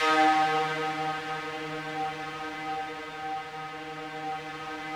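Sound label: FOG ATTACK D#2 Right, Yamaha VP-1; Music Store; 90's Sample CDs; FOGATTACK 3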